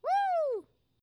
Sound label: Vocals